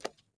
fs concrete, footsteps

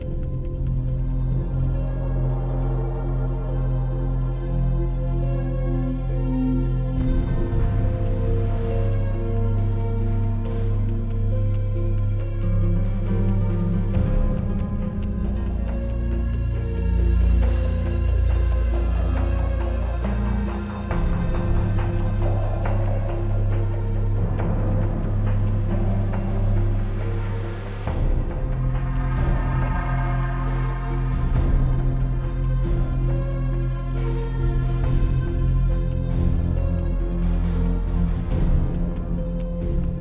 Menu Quiet; Tom Clancys Ghost Recon Advanced Warfighter; XBOX

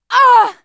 hurt; True Crime Streets Of LA; XBOX

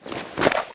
change, weapons

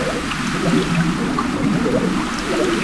ocean, tracks; draco

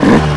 throttle blip med, YAMAHA

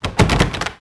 door wood locked; Max Payne

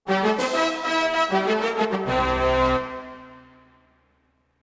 music mission succeed; Ty The Tasmanian Tiger 2 Bush Rescue; XBOX

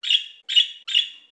bird; Virtual Pool Tournament Edition

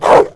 dog bark

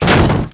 damage scaffold

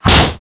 leadpipe glass hit; projectiles; melee hit; Max Payne

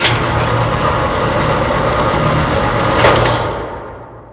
large door opens; Max Payne; cold steel

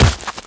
body fall vegetation

